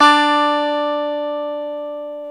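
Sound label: E 12 String guitar; Club-50 - Foundations Roland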